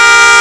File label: horn; Mafie 1